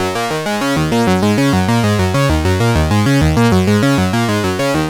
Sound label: battle scene, ゲームのバトルシーンのようなショートループできるジングル。レトロゲームにありそうなビット感、ピコピコ電子音のチップチューン。; ゲーム シンプル 機械 焦燥感